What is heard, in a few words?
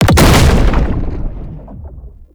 Rupture Cast Impact; Roathe; Warframe; Tau; Abilities